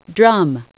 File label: DRUMM; bayarea; SFMuni